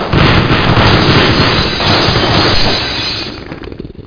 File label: Cabuuum; PobreJoaquim